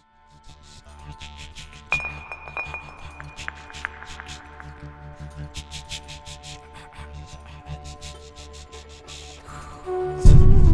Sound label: Silent folk